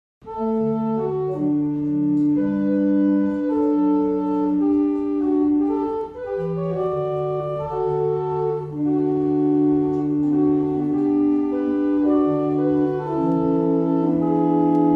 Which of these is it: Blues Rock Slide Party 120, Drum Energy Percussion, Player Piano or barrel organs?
barrel organs